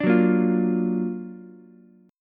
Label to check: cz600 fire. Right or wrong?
Wrong